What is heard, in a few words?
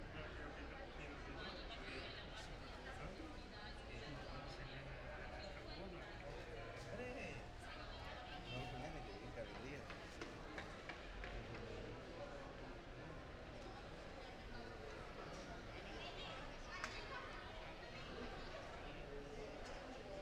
Ambience, Distance; City Sounds